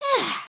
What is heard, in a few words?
ninja build forward, emotes